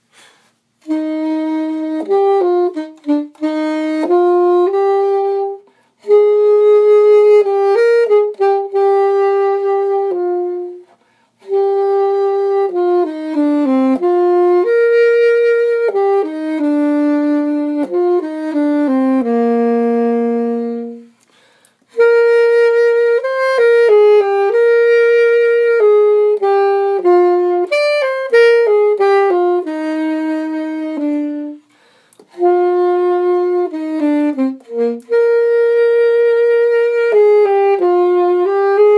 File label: alto sax